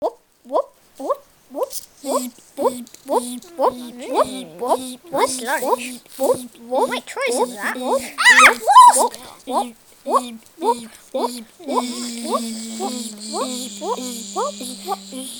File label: Wasp